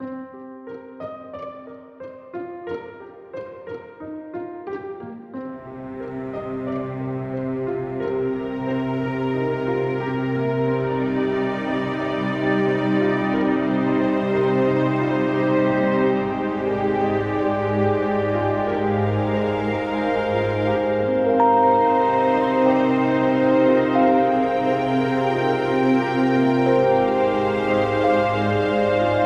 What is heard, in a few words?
Tempo 90.0; A Rose in the Twilight; PS Vita